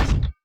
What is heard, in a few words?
Item putting